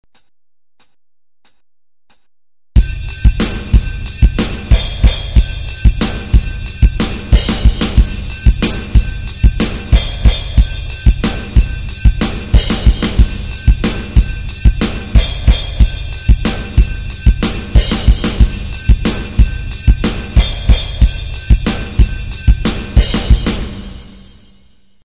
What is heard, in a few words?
drums